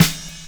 Snare Cymbal; Wiggle Disc

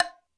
Hollow, Drum Machines Alesis D4

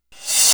HH SR16; 90 s Sample CDs; Best Service Dance Mega Drums

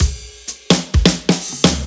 Fills 128BPM, Clubsounds; Vengeance - Essential Clubsounds Vol.5

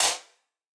Wider Clap

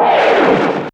Swoop, Fairlight CMI IIe

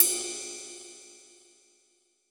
Ride Cymbal; Roland BK-5

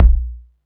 BD SOFT, Techno 138 BPM; Best Service ProSamples vol.54; AKAI

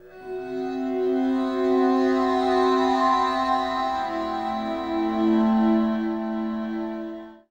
Scree Waiting, Acoustic Drone; Sonic Foundry (Sony Creative Software) - Friendly Fire; DroneLoop

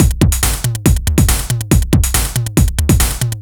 Analog 140 bpm, retro drum machine samples, Loops

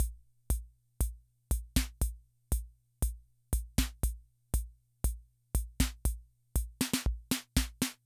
Loop, rhythm 55, classic machines

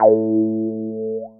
GUI MULT; EastWest; Dance Mega Hip Hop Electro

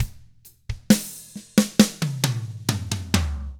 SLOW ROCK; 66SLOWROCK; Drums

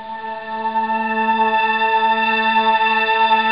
up string; totalrecall; ST-XX Amiga Soundtracker Sample Packs

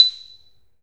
MARIMBA; 3D Sound; 3D Keys VOL-1

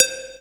SEQUENCED, DRUMS+PERC; Q Up Arts; Dream Experience

